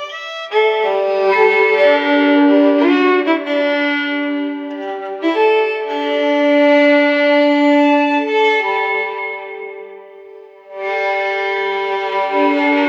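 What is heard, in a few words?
compose a solo violin piece, classical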